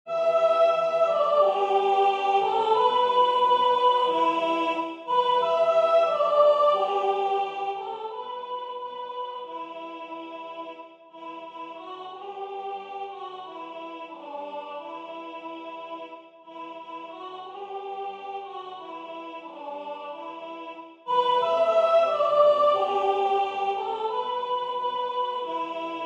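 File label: soprano; légende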